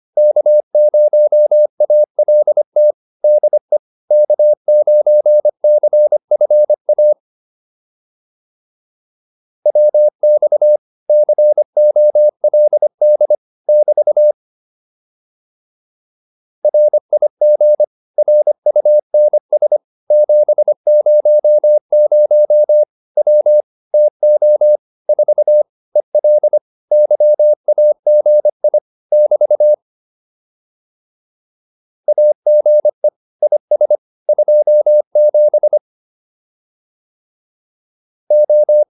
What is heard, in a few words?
QSO, intermediate practice files